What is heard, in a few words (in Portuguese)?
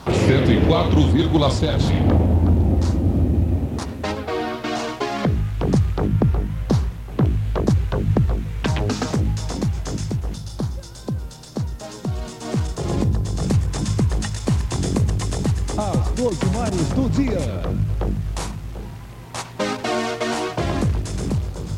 vinheta; 104,7 FM Sao Carlos SP